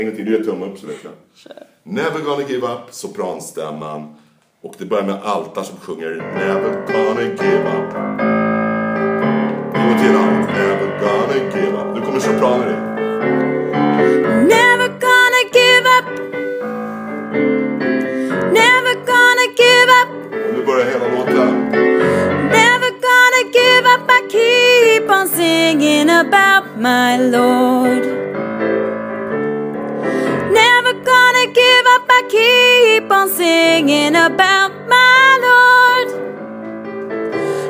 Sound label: Sopran; Gospel